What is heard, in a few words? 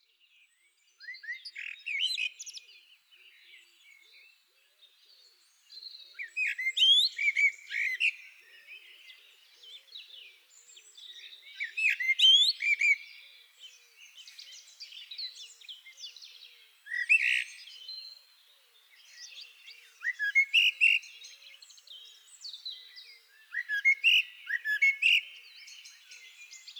Turdus merula; SETE